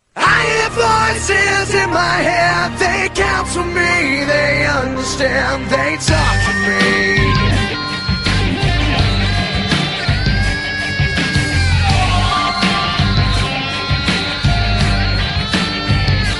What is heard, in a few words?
Voices